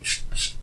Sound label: step grass